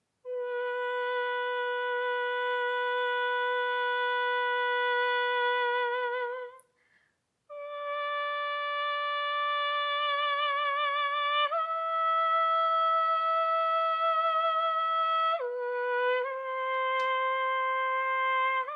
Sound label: Descant; Ecce Descant